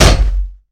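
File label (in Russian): сильный мощный металлический удар кувалдой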